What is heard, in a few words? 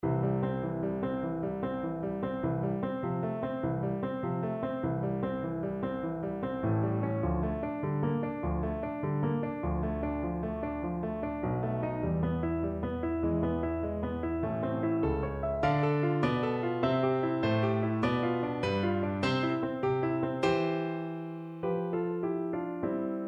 High Voice Octaves accompaniment; MTB Singing Vaccai